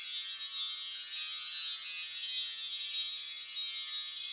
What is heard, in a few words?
near Win; netent